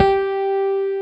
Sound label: JV Rhodes; Pro-Rec - Synthsonic